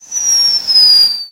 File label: atgm whistle; guns; tanks; War Thunder